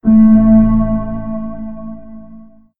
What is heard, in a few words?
start sound